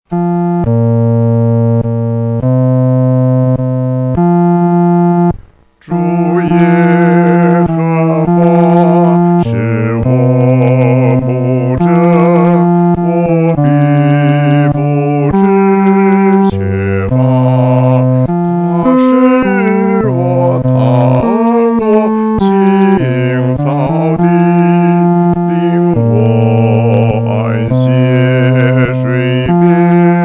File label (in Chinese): 独唱 第四声; 主是牧者